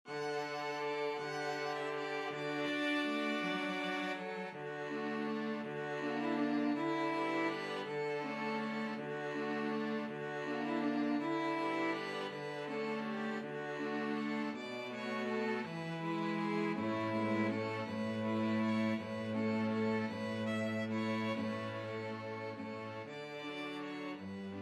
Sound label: string quartet